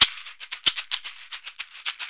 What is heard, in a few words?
Caxixi Beats; Roland LCDP14 Africa VOL-2